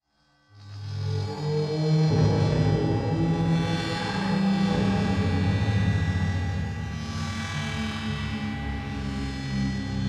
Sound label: Stinger Intensity; The Park